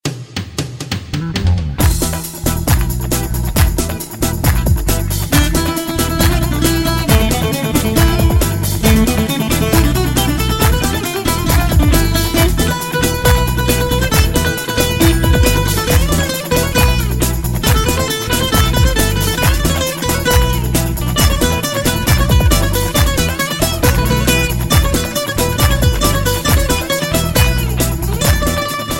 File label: Greek Belly Dancer